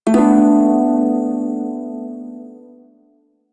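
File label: Prime Shawzin Major Chord L; Music; Warframe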